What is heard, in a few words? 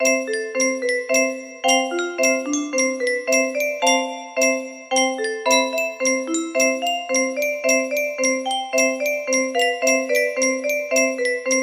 first waltz